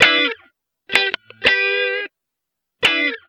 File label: 170 G, Guitars; Zero-G; Total Drum Bass